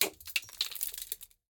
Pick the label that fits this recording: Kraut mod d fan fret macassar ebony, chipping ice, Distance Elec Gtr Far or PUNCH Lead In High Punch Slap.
chipping ice